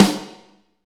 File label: SNR snare, Snares; Northstar - Drumscapes Roland